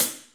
old school perfect pedal hi hat B key; hat samples

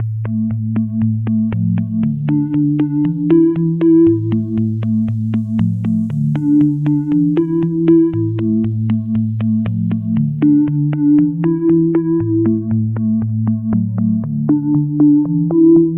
key lead 118 bpm; loops; sc essentials

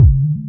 Damped Experimental Techno Kick; kick drum samples

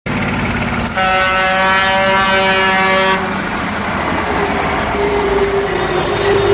honking in heavy traffic; city

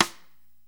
noisy acoustic snare sample f key; snare-drum-samples

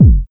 studio steel kick drum sound G key

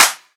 clap E key; percussion; single hits one shots

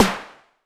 perfect snare sound g key

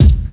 trebly bass drum single shot c key

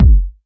kickdrum sample g key

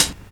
00s original hip hop high hat sample B key; hat samples

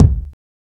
short reverb bass drum sample c sharp key; Duration 0:01; Samplerate 44100 Hz

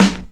90s bassy rap acoustic snare g key

